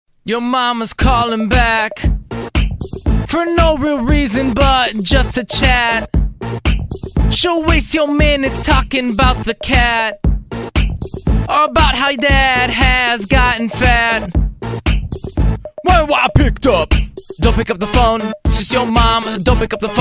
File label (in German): lustige lieder